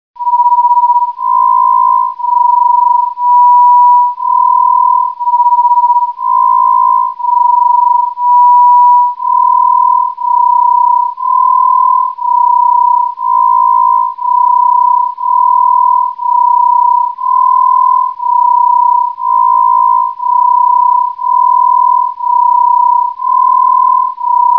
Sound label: throb